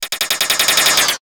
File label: Sci-fi Engine Rumble